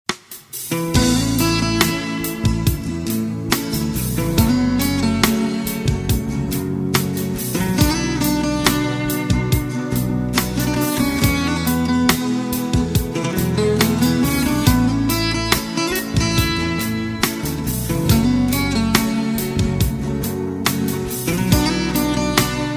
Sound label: nepali nice music